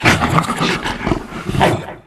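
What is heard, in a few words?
hyena ambience heavy snores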